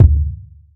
short reverb steel kick drum d key

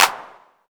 hand clap sound a sharp key

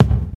fresh mid range bass drum c sharp key; kick

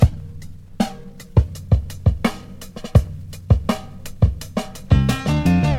83 bpm drum loop g sharp key; drum loops

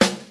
perfect snare one shot g key; snare drum samples

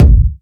rich lows kick one shot g key

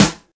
short reverb steel snare drum c key

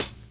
snappy hi hat closed sound C# key; hat samples; C sharp

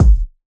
kick g sharp key; single hits one shots

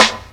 studio acoustic snare sound b key; snare drum samples

